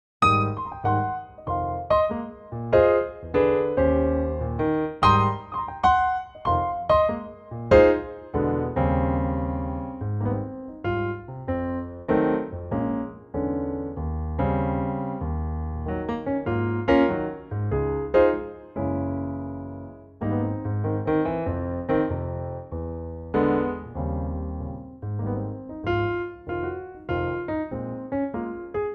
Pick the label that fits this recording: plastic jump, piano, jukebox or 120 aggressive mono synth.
piano